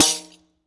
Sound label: TAMBOURI.HIT; Best Service; World Colours